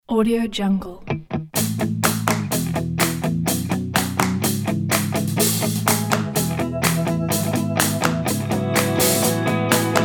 party intro

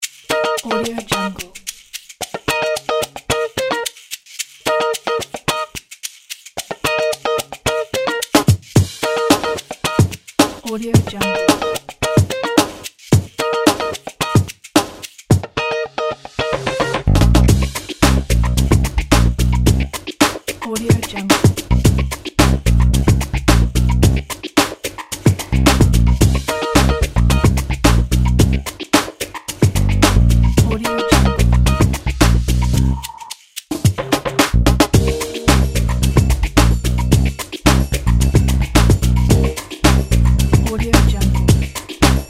funk groove background

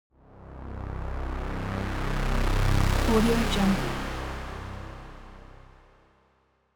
atmo drone heavy saw bass swell short; smartsoundfx

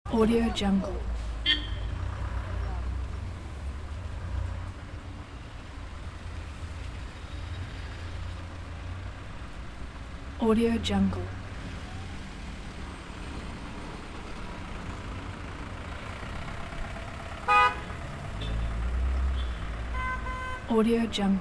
traffic jam

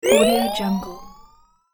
health energize

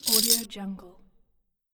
handful of bottle caps

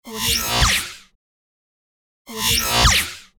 buzz logo

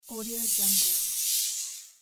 electrical current

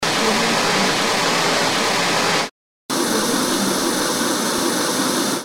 tv static sounds

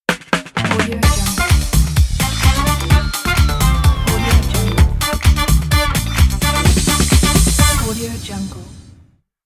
funk groove logo; flaviocortizo

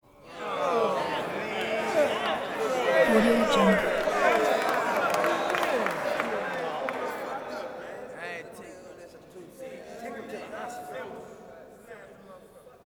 audience complain something gross unhappy; prosoundfx

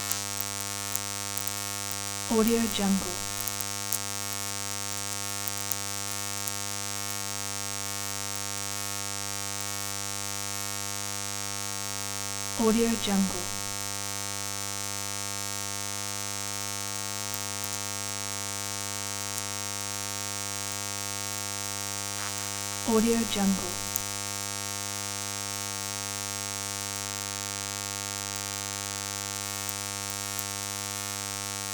electric static noise

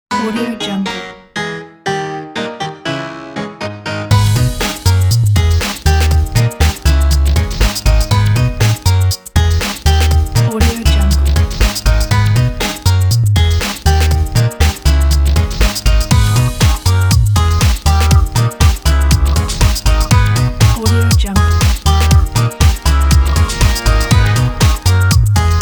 funny salsa; gainmusicproduction

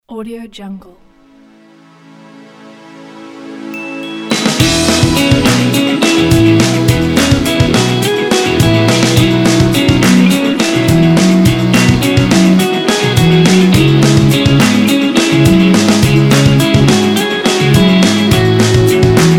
romantic indie rock music

